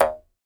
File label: jaw harp; E-MU Producer Series Vol. 7 – Old World Instruments